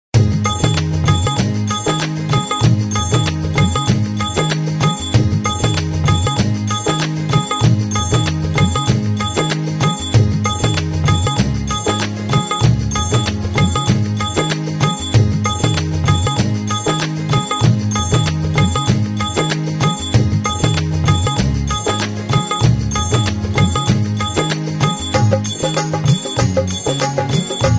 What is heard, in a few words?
Karaoke Song